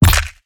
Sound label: Teleporter Melee Impact Base; Evolve; Wraith